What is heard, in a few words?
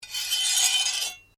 Blade Scrape Medium, Sword